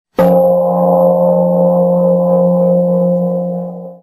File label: gong